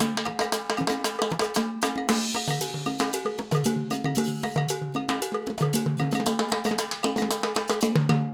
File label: Acoustic Percussion